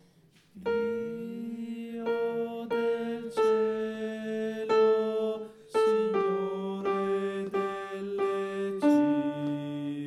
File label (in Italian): BASSI